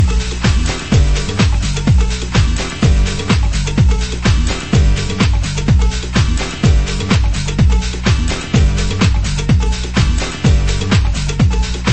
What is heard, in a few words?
spanish strings